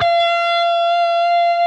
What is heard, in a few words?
PRS F#, Guitars, LEAD; Spectrasonics; Hans Zimmer Guitars VOL-1